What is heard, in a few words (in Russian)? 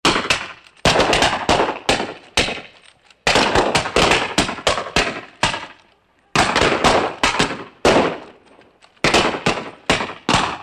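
одна за одной; Звук петард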